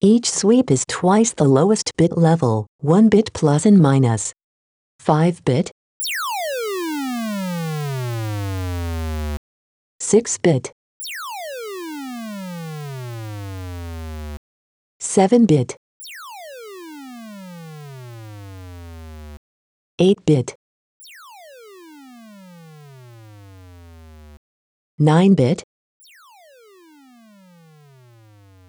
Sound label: multi level sweeps